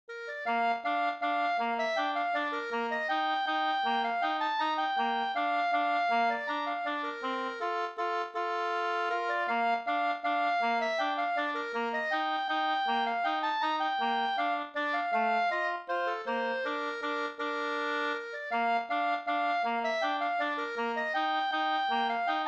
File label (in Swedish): vals; KJ Kjellman; Skoldinge